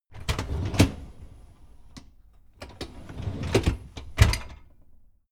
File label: dishwasher tray